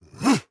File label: attack medium